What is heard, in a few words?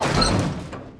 garage open